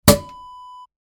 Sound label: champagne cork pop